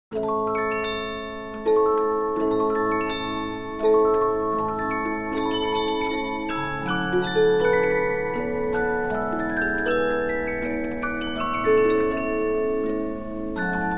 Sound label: Polyphon, 15inch disc musicbox